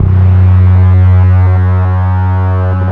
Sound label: Dist Harmonic One Shot F#, Bass; Bass X